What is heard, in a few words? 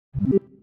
heal; WolfRPGEditor